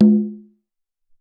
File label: Tumba